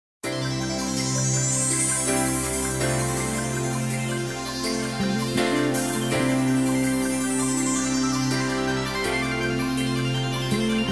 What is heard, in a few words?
brass minus